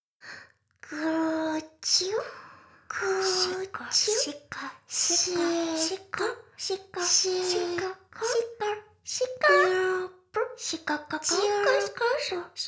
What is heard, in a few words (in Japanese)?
しこしこ, ASMR; 魔法少女マスカリマッスル